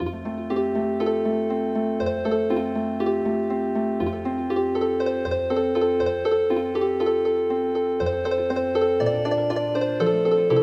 Healing Harp

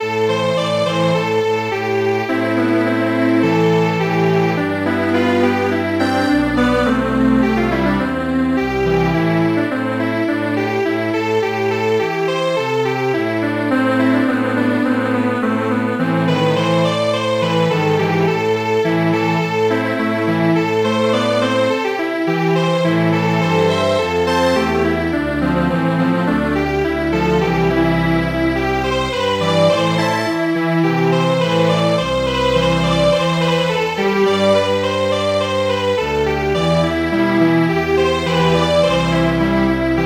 Strings Quartet; Arohnee

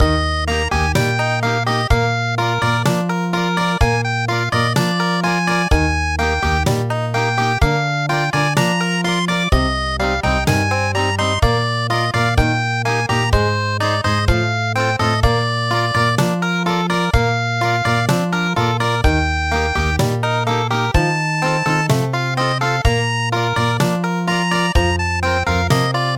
Country

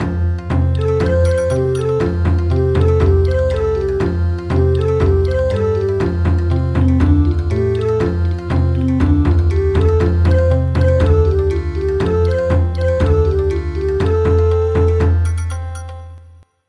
Bon Odori

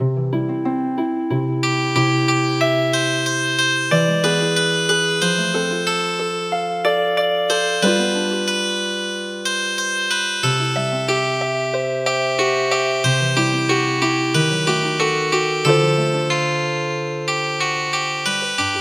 Harp

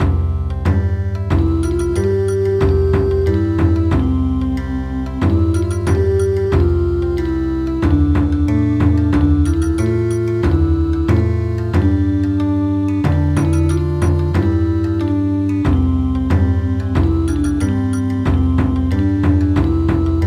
Bon Odori; My Baloon

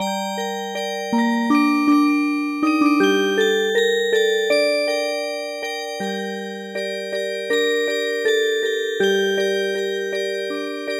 Music Box; The Nanbu Cow Driving Song

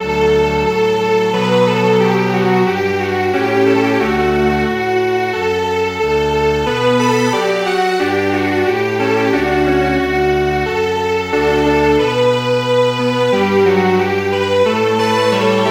Strings Quartet, traditional; Many Years

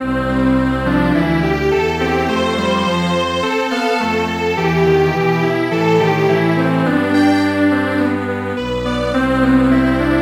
Strings Quartet

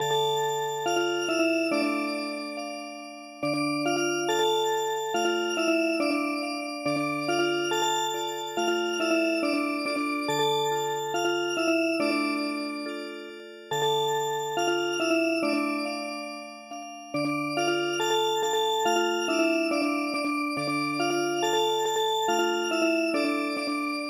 Music Box; Garasa

